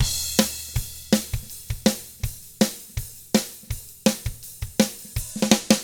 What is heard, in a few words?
ROCK, Drums